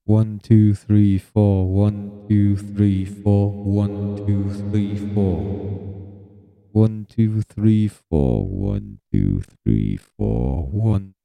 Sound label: Roland DJ 808 Vocals